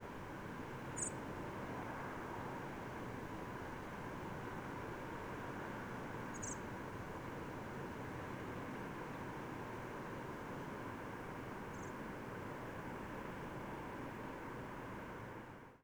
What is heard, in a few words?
single v short